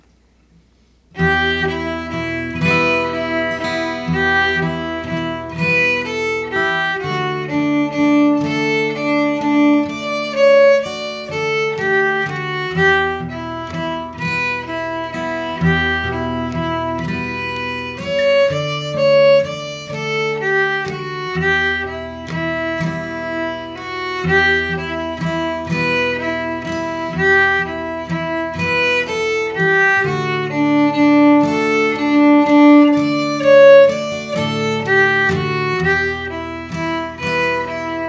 jig slow; swallowtail

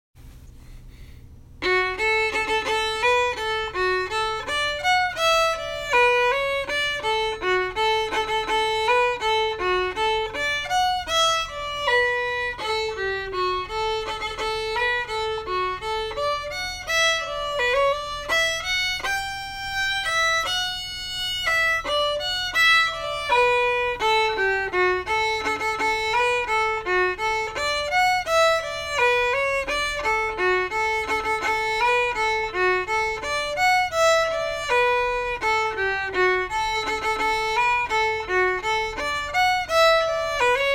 slow; silver spear

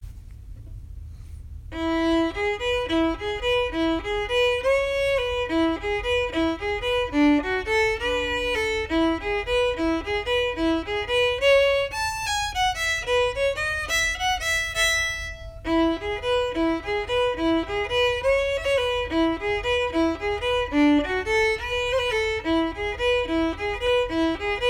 jig

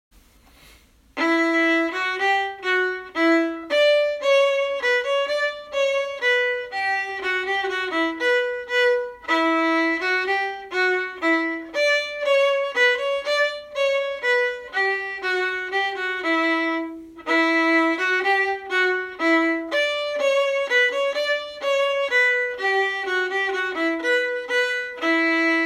Bouree d'Auvergne viola

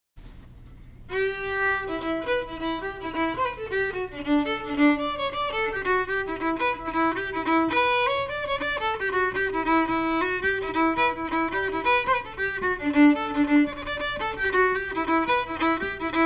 swallowtail jig

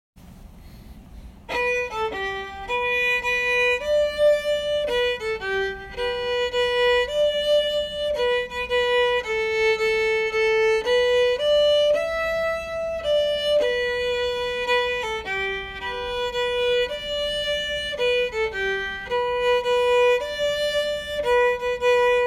waltz; tombigbee

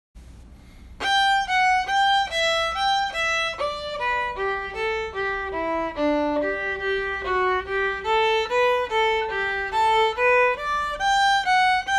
slow; lackthorn stick

